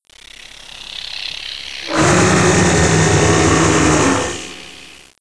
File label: geneworm beam attack; hl1 npc